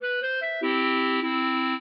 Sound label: clarinet; Mozart